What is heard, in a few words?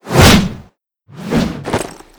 Windy Giant Attack; Seer; GamigoNA